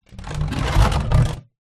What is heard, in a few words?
foley container water plastic empty drag ground